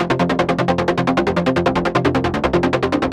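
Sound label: fast, fast sequence; Music Store; Yamaha VP-1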